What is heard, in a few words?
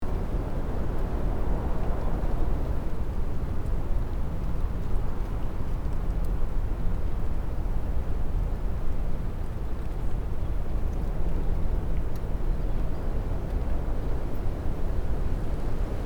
Sand dunes gentle wind ambience